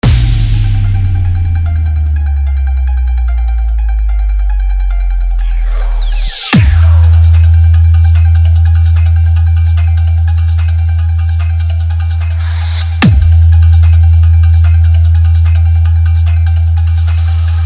Hindi Hit Full Desi Bass Dance Mix

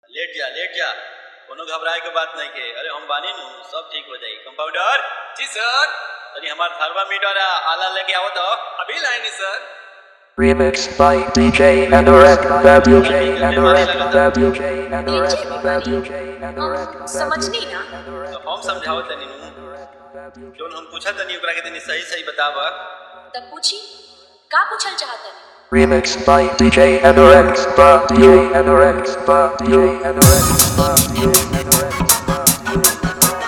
Hard Bass Dancing Mix; Hi-Fi

